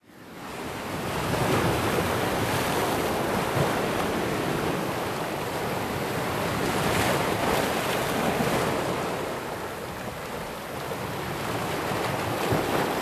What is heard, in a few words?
ambient port default; World Of Warships